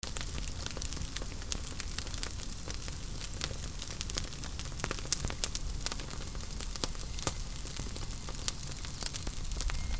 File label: FIRE